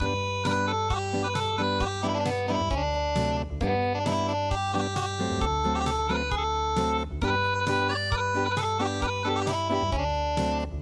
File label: Acoustic; ASAWA DOKOKARA